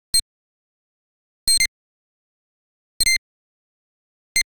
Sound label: Generic Click Digital